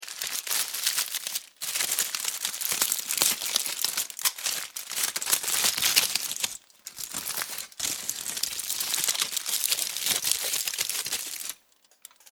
Silver Foil, Crinkling sound of silver kitchen foil.; Household